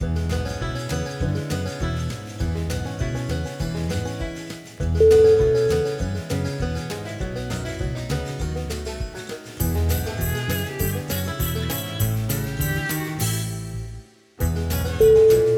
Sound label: bluegrass caper